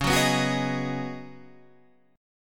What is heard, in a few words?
C sharp 9th Suspended 4th, down, Guitar Standard; strummed; chord